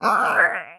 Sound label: mannequin grunt